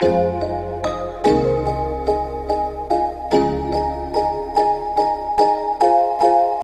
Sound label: Basslila Sample